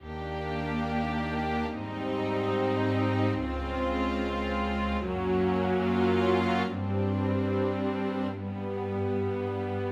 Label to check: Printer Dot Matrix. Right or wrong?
Wrong